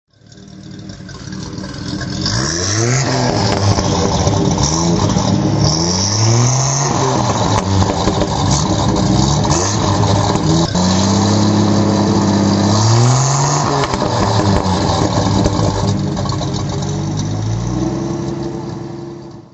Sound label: Bentley; petersen bentley